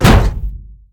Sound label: hit human; Blind Drive